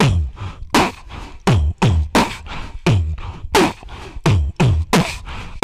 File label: VOC DRUM, MOUTH PERC; Best Service Hyper Dance